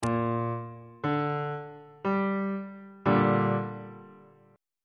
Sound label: f double sharp minor 1st inversion on bass clef